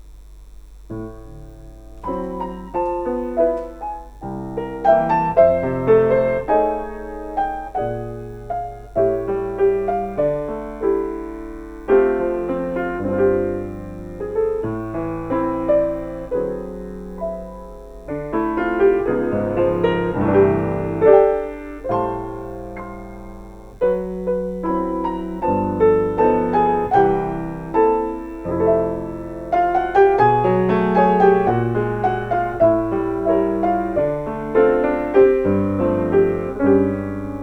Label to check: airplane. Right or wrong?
Wrong